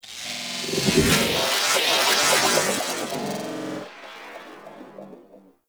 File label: Transferrence Glitch; Gameplay; Warframe; The New War